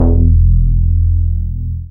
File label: BAS MINI, MOOG; Sound & Vision - Gigapack II CD 1